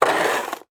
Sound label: Weather Snow Shovel Scoop Snow And Drop On Cement; AdobeCC